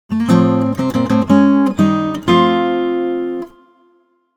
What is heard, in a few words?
Item Get